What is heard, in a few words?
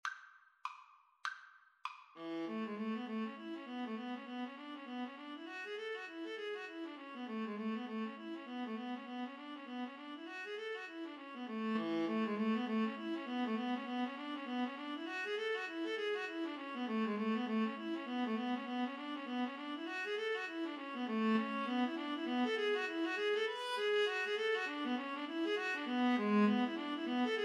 midnight dance, Viola-Cello Duet